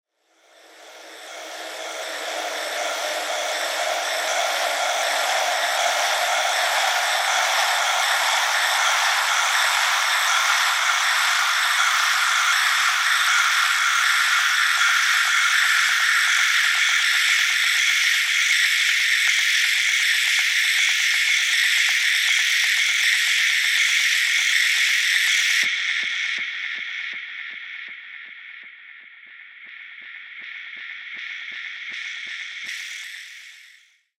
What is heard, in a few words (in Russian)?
zvuk nashestviya celoj armii saranchi